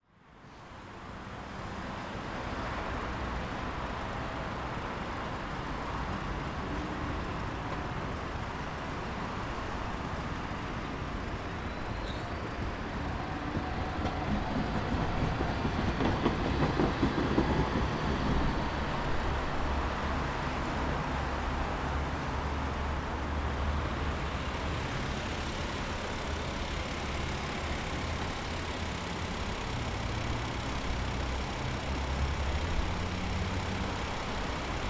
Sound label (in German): goetheplatz